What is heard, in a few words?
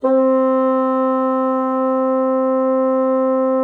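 bassoon piano C4; Xsample VOL-6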